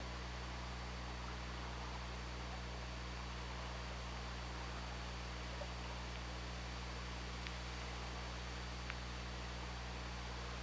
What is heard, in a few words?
OrcaLab